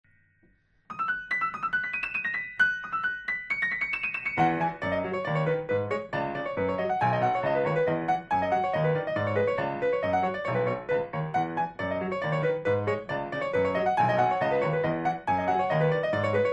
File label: Piano